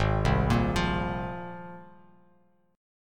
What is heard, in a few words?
G sharp 6th Add 9th G sharp Bass Standard; arpeggiated; JGuitar